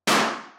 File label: firecracker